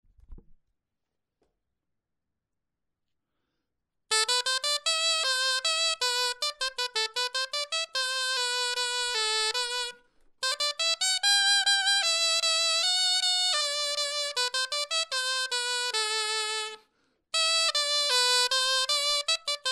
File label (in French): clarin etude